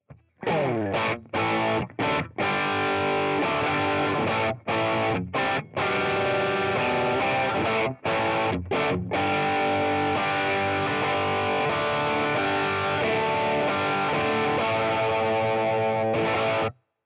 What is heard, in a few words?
Line 6 Catalyst Voltage DI Out